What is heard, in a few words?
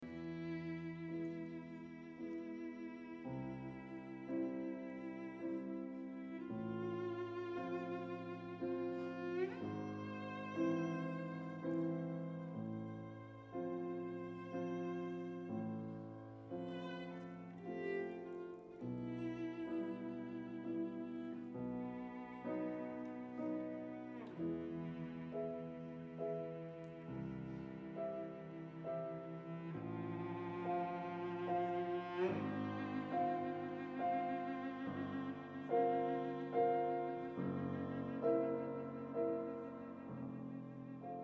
arpeggione